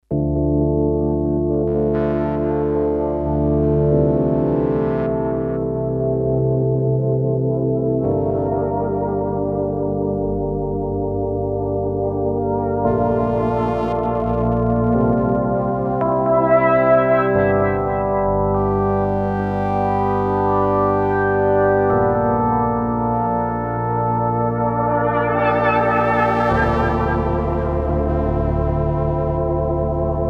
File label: Pad Layer